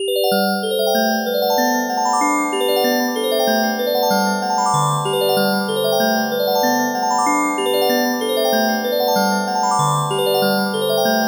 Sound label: Wind Chimes; LG Qua tab PZ LGT32; ringtones